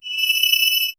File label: crystal chime